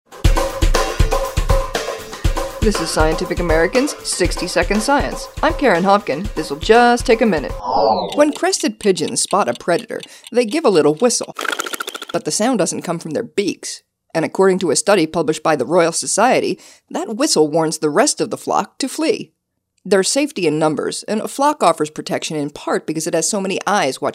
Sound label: Whistle